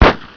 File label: CRACKER, 爆炸